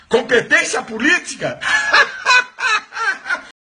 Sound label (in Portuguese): risos; Malafaia; Competência política hahahaha